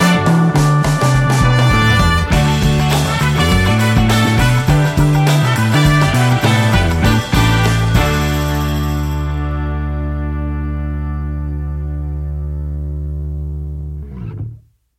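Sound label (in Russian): muzykalnaya otbivka, musical jingle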